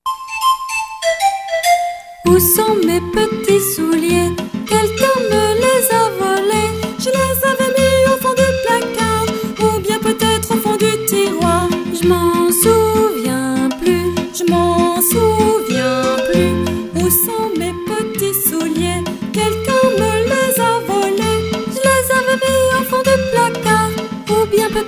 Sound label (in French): petits souliers chant